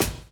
DRUM CHOP; AMG Gota Yashiki Groove Activator

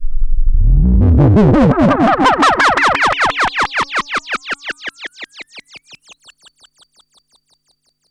fx res filter mods; One Shot FX; Sample Magic; Transmission-X

